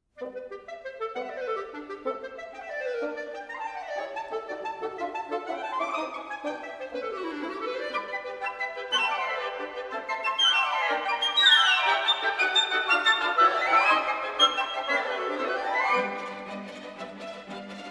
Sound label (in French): Symphonie no9 3e mvt Presto; Dmitri CHOSTAKOVITCH